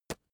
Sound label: magazine tap